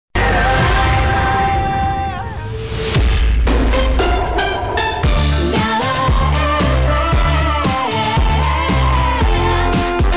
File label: 85 110 MHz